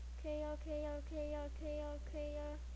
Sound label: quail